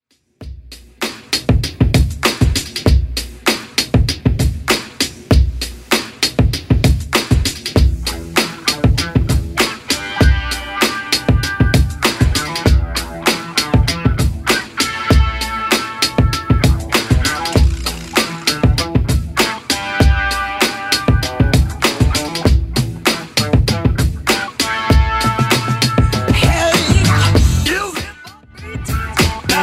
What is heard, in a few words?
80s Funk 98 bpm CLEAN